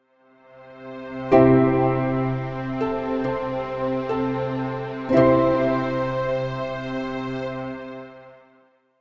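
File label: sounds with an effect of completion, ending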